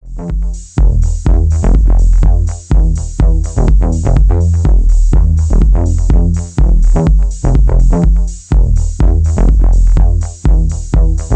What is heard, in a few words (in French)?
orgue electronique; Clone